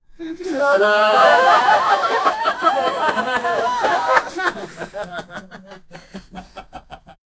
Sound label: five people laughing and